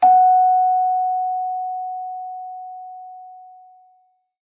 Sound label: chime